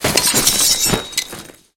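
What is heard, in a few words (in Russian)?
рассыпанные на асфальт метательные ножи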